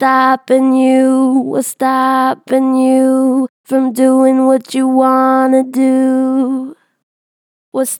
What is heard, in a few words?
Strong Vibrato, Natural Vibrato Strong, Vocals; Stopin you; Harmor Vocals